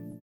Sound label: VIBRA; Spectrasonics; Metamorphosis